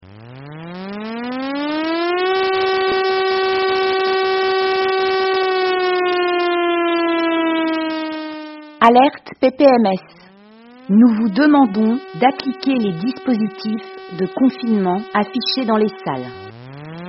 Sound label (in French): sirene mise abri